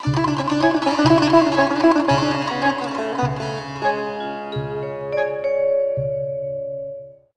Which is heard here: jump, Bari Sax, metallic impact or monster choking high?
Bari Sax